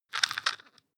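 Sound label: floss pull out squeak, bathroom; haus-garten; badezimmer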